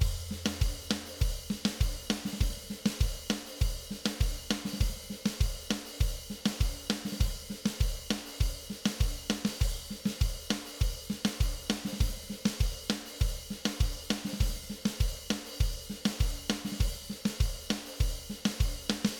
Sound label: Cumbia Verse